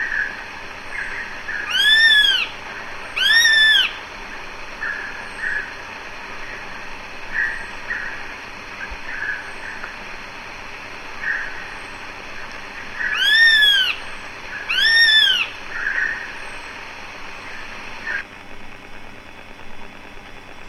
epervier a gorge grise, chant

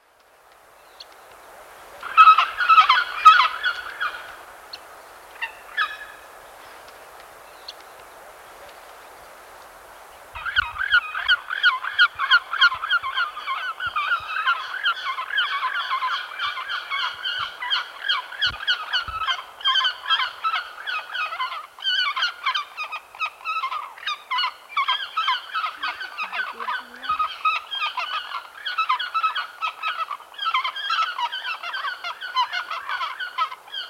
pygargue de pallas, chant

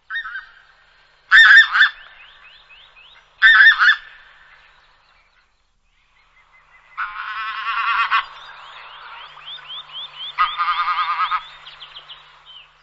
oie des moissons, oiseaux chant

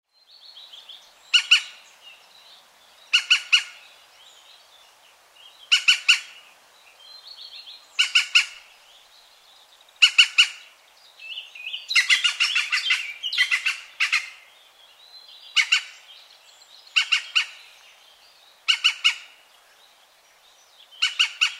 pic vert

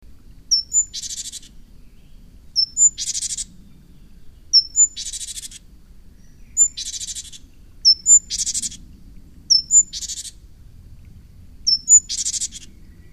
mesange charbonniere, chant

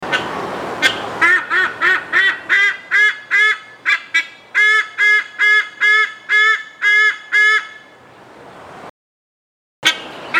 pygargue blagre

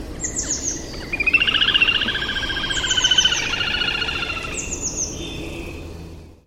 grebe castagneux; chant

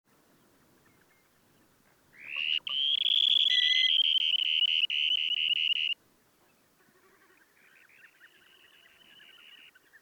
becasseau variable, chant

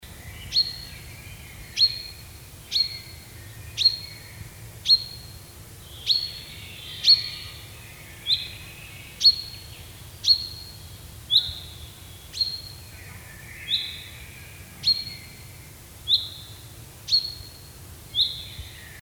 pinson des arbres

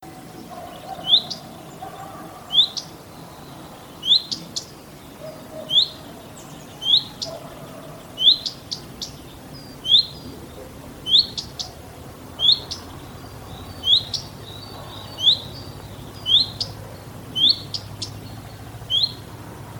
rougequeue a front blanc

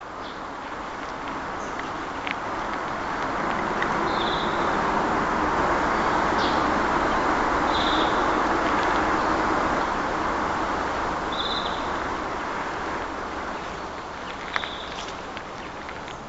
mesange huppee

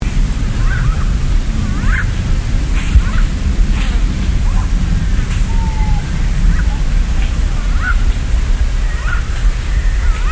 pygargue a tete grise

